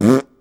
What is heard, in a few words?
fart short